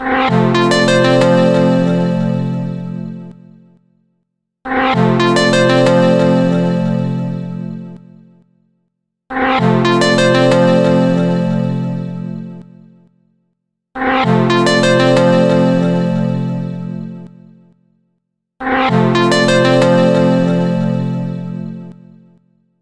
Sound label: Alienized Ringtone